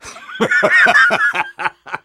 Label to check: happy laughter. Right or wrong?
Right